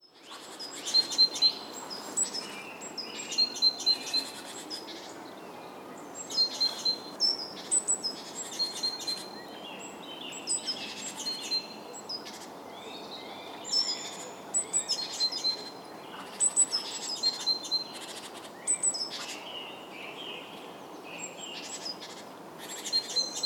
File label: Bird, Soundscape